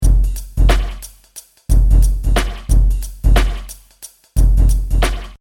Hip Hop Drum Loop 90 Bpm Swing Without Vinyl; Royalty Free Drum Loops; Next Door

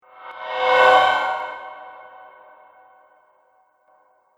Mangled Metal Whoosh; Andrew Fleming

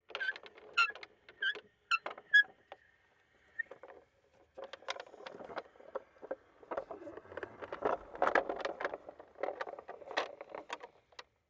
Cart Wood Roll, Cart Roll Slow Squeaky; Rolling; Objects

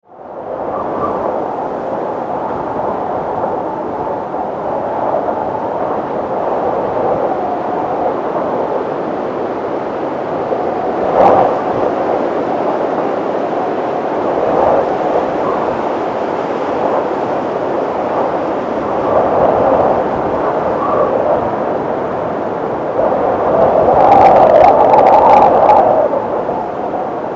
Wind, Storm

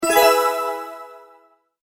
multimedia tone advance; McKinney Sound

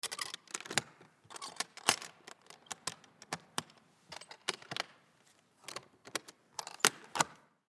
Baritone Saxophone Case Latch Lock; Brass; Blastwave; Sound Effects:Musical

